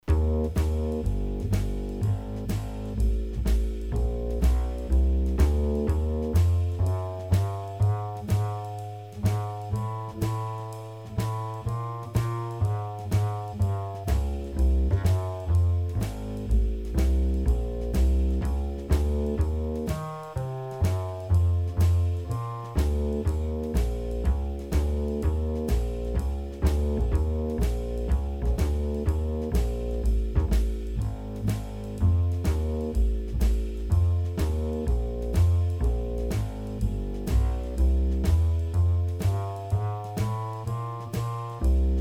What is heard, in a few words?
E flat BLUES, Ear Training; bass and drums